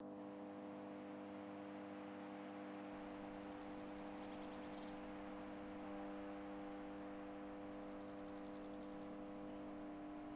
truck ambience; seeding-planets

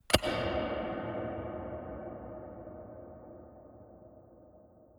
pickaxe reverb; seeding-planets

